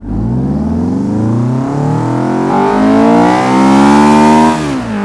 first, vehicles; gmod; tdmcars; s2000

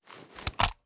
sm uzulu reload empty xmag fast end; reloads; iw8; gmod

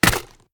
ar akilo47 drum reload mag out; weapons; viper

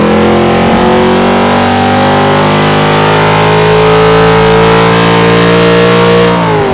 third, vehicles; challenger70

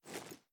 ar reload arm; weapons; gmod; viper

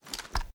reload empty xmag end; gmod

